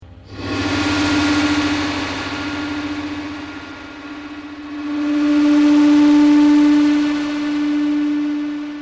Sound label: space wave